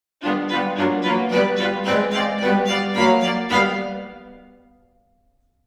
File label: Strings Quartet Spiccato Tree; Sacconi